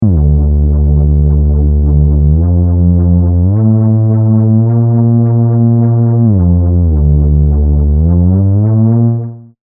serum basses; chiqui audio

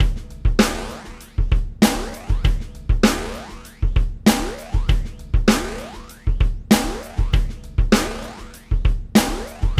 snare drum gallop

